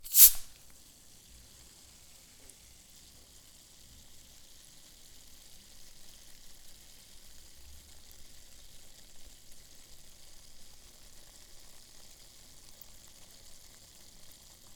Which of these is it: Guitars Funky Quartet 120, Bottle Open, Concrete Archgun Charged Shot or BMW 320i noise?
Bottle Open